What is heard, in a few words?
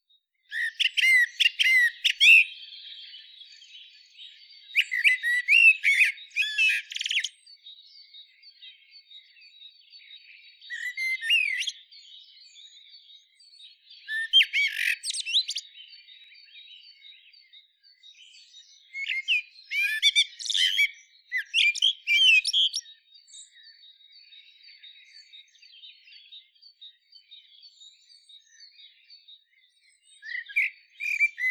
Turdus merula; SETE